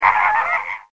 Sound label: flamingo